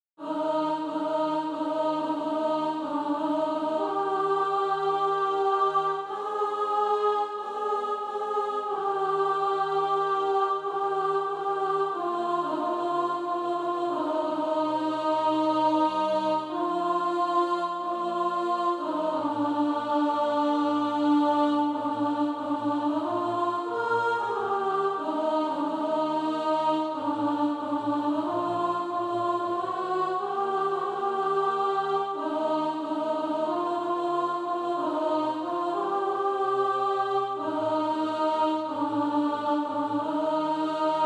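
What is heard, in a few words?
alt, Practise Music; Choose Ye Today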